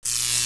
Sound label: hook; jb engine